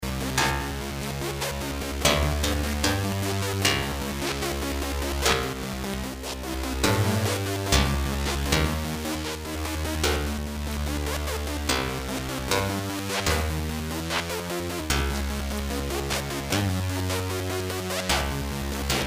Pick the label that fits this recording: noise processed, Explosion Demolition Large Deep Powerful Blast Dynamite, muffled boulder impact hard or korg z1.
korg z1